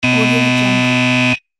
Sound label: wrong answer alarm buzzer